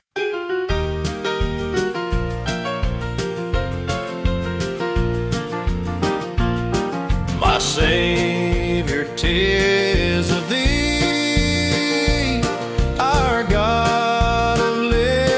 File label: Country, Piano; My Savior, 'Tis Of Thee